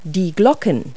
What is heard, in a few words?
BELLS; Multi-Lingual Talking Picture Dictionary